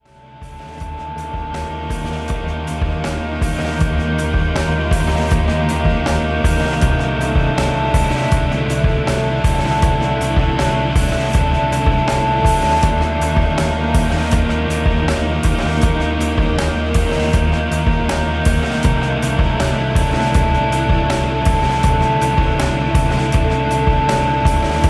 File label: beat